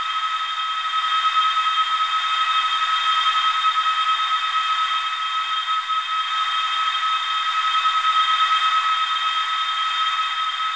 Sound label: Aircrafts L-39 Starter; DCS